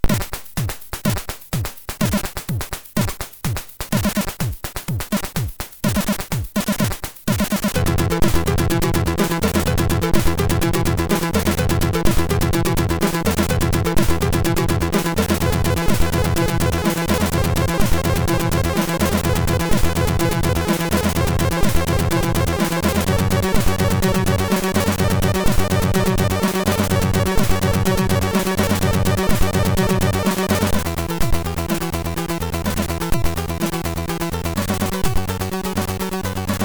Short Techno sid MOS6581

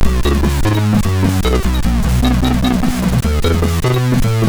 Test, MOS6581; hvsc

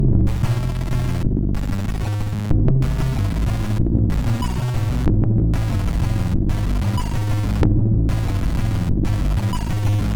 Space Aliens Spaceships, MOS6581R4; hvsc

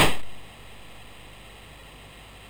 sid MOS6581R2, GAMES; Face Off

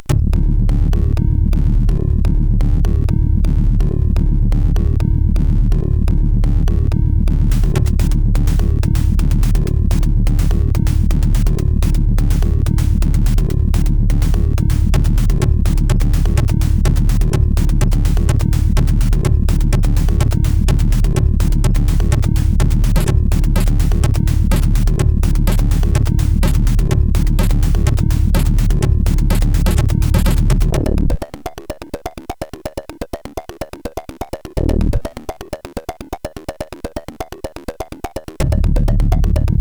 Noise, CSG8580R5

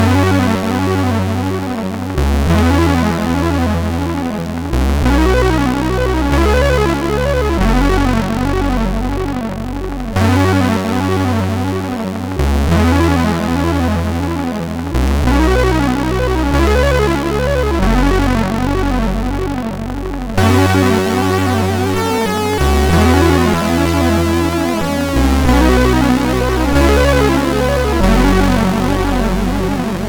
Opening, MOS6581R4

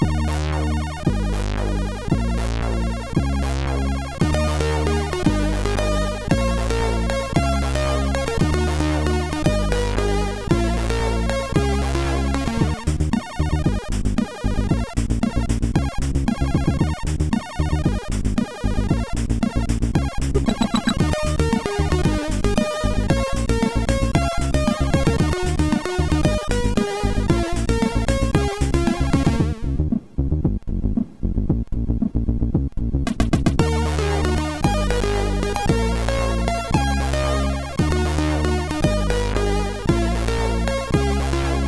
sid MOS6581R4; Driven 9 Intro; hvsc

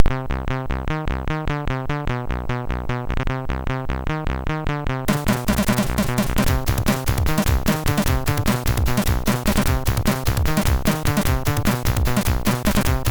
Breathe, MOS6581R3; sid; hvsc

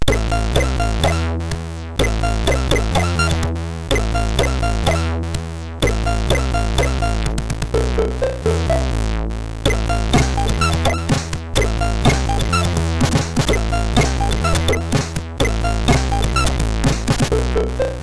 Action Drums, MOS6581; sid; MOS6581R3

